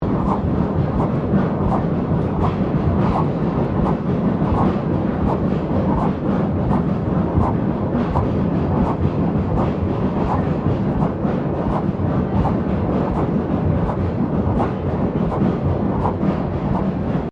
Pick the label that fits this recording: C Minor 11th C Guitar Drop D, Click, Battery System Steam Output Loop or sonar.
Battery System Steam Output Loop